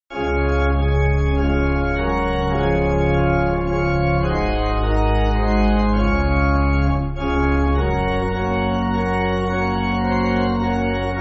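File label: Pipe Organ, Music Backing Files for Churches; Press Onwards; Sankey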